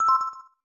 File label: Jewel Chime; Doraemon 2 Nobita no Toys Land Daibouken; SNES